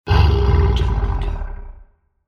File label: Lion Roar